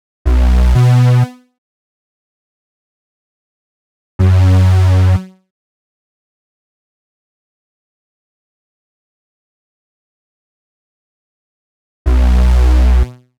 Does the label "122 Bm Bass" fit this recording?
Yes